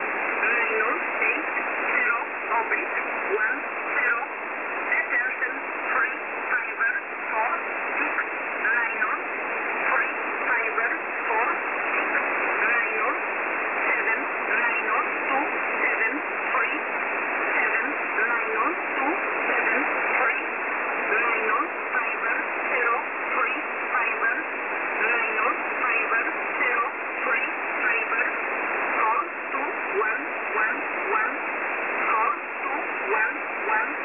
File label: usb msg